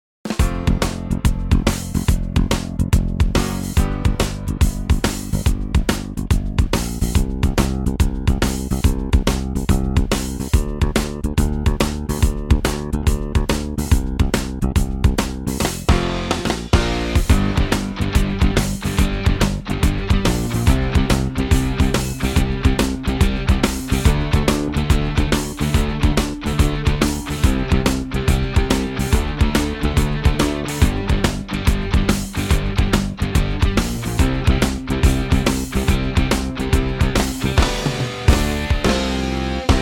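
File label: G Bb, male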